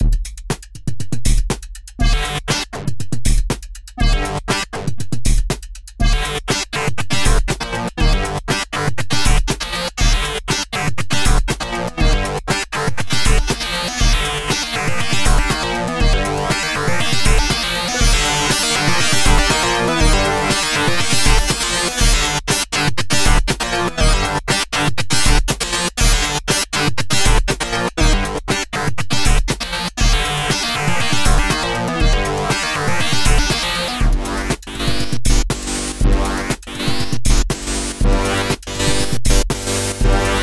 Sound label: PreenFM MidiClock